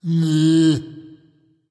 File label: tongue, voice; smite; voicepack; ymir